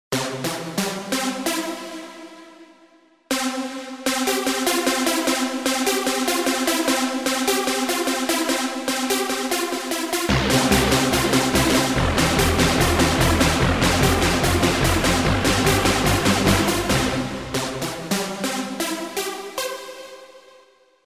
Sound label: Electro